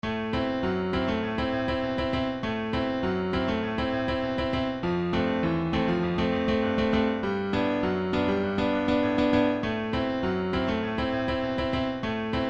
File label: Piano Test